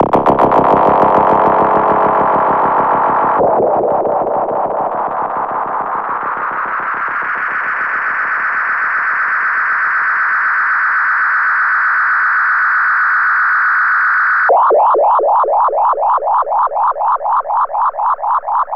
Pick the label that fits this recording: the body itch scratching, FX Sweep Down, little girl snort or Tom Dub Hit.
Tom Dub Hit